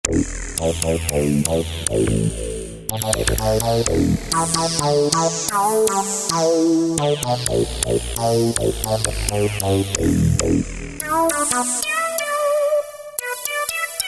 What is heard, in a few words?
Mosquito Boy, Korg TR-Rack